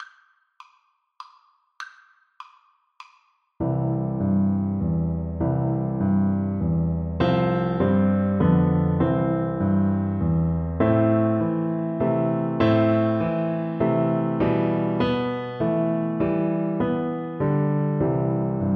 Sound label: Piano Duet